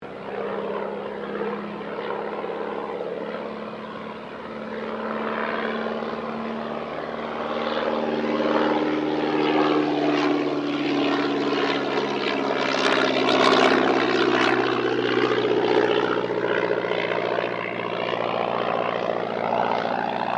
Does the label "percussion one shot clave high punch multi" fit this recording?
No